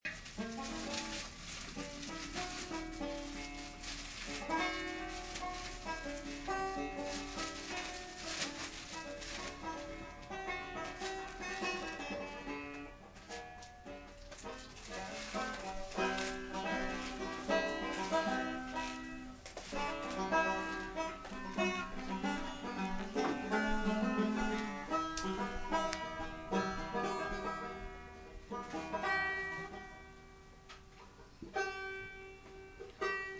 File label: banjo